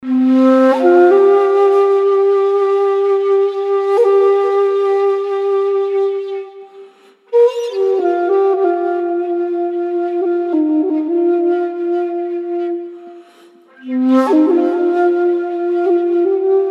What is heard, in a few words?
Shakuhachi Flute